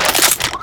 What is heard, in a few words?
awp bolt forward, weapons